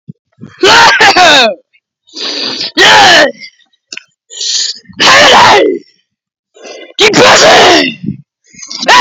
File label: Annoying Grown Man Crying